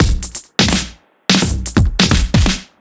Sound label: Duo Swing Mix, 85bpm; off the grid